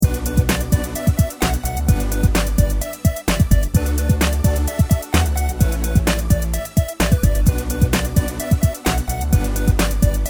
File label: instrumental